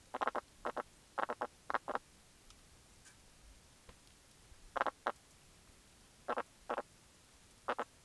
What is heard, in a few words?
Prionotus evolans, soniferous